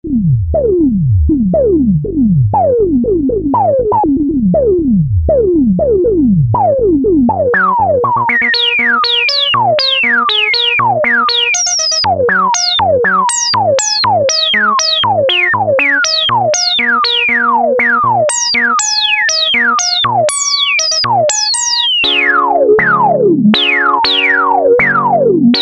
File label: siel dk70 filter lpf q100